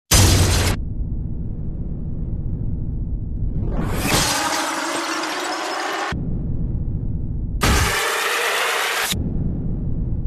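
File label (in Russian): Страшные звуки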